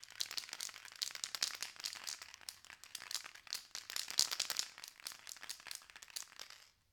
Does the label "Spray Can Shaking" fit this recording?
Yes